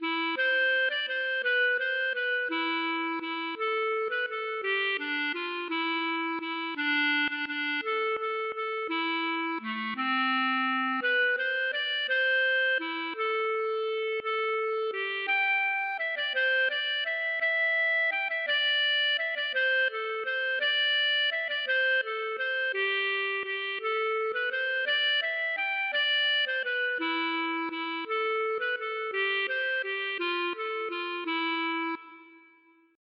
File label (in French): valse